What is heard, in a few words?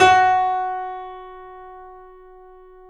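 piano, F#, right; InVision Interactive - The Piano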